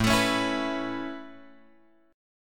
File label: A Major Add 9th A, chord; Aadd9 Chord {5 X 7 6 5 7} strummed